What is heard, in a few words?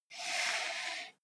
computer mouse draw